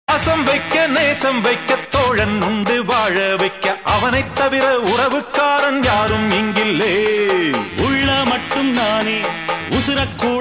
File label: Tamil Friendship Ringtone; 2009 Tamil Hit Ringtones